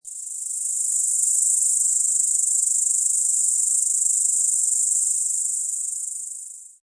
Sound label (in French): Cri; MOBICLIC 65